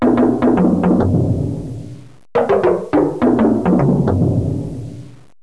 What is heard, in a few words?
Rock Tom